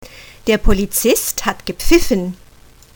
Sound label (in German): pfeifen; PFEIFEN : der Polizist pfeift